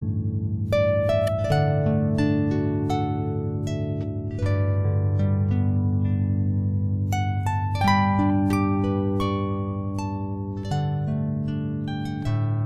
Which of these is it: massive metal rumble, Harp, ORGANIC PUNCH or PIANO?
Harp